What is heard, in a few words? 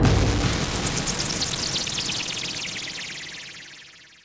Explosion